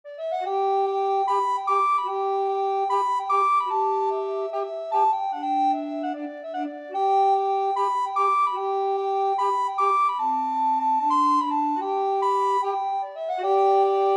black bear, recorder quartet

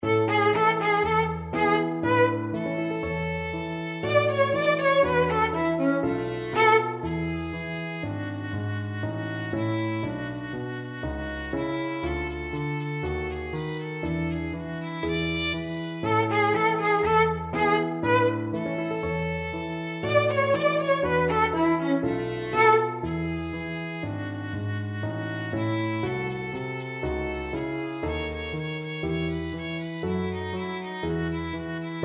violin

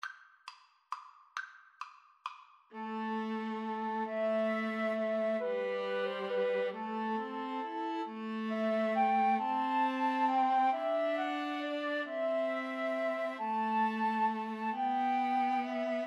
Flute Violin Viola

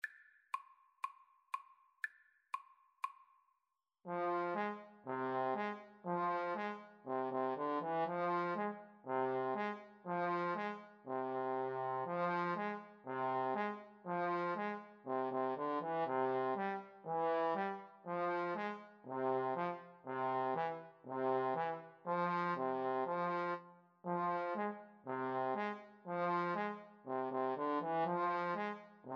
Odessa Bulgarish, Trombone Duet

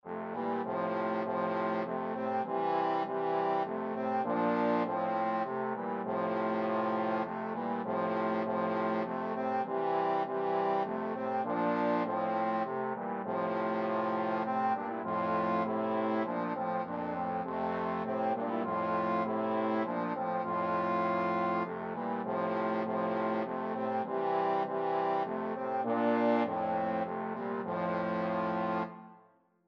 come thou fount trombone

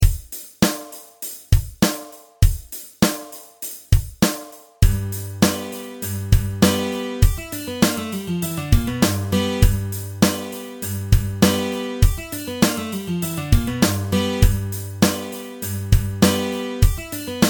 country riff, guitar

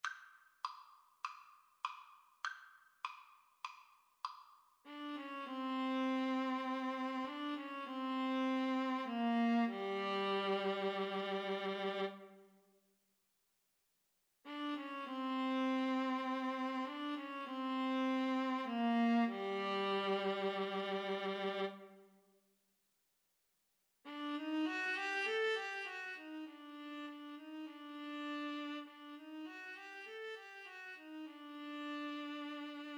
Viola-Cello Duet

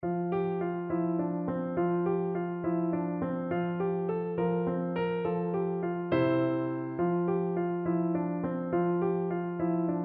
slumber song piano

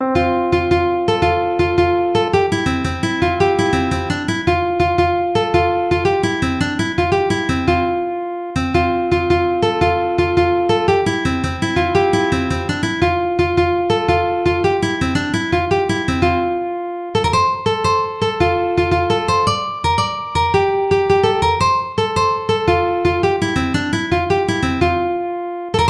ukulele

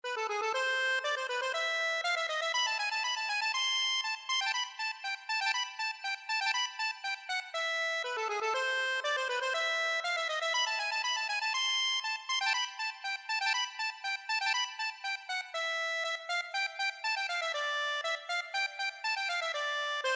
march, accordion; mozart turkish

